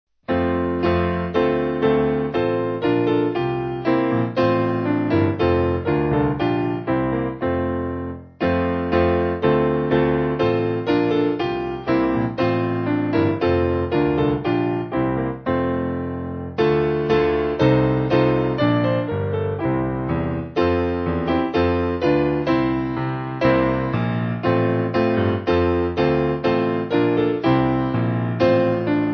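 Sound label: Piano, Music Backing Files for Churches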